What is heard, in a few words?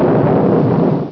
basic flyer die; F.A.R.M. Patrol; MacEasy Magazine CD - Issue 18